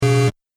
Gameshow Wrong Answer; Miscellaneous Electronic; Gameshow - Wrong Answer 2

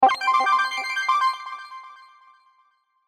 Synth Run Fast High Short; Musical; Synths; Blastwave

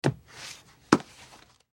Book Grab; Books; Foley

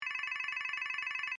Phone Cell Ring Generic; Cell Phones; Electronics

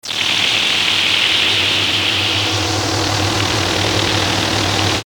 Static Filtered Warble, Static Rumble Noise; Production Element Imaging Element Accent Transition; Blastwave; Static Sound Effects

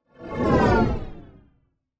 Sci Fi Spaceship; Spaceship Whoosh Fast Deep; Spacecraft

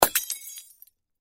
Crash Glass Bulb Christmas Break Drop; Materials; Blastwave

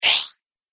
Arcade Game Thin Laser; Video Game Sound Effects; Retro Inspired; Nintendo, Atari, C64

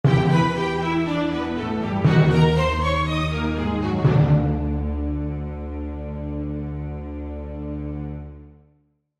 Accent Orchestral, Accent Orchestral Dramatic; Accent Orchestral Dramatic Long; Orchestral Sound Effects; Blastwave

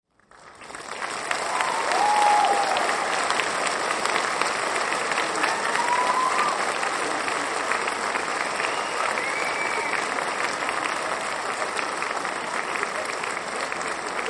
Crowd Applause Cheers Audience Grad Med; Auditorium; Graduation; Applause (Sound Effects:Human); Big Room Sound

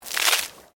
Water Splash Concrete Small

